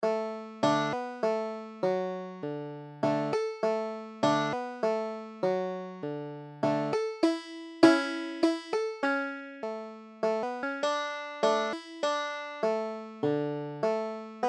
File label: silent night, banjo